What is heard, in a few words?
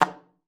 D3, alto sax; Xsample VOL-2